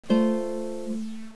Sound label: kbd; Music Toys; kbot